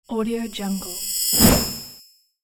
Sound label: reverse woosh